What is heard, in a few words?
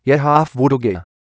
yeehaw